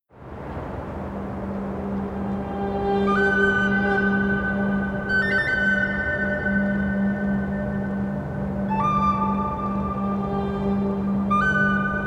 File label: woman Bright Flute; AEON